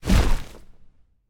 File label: wing flap; smite; horus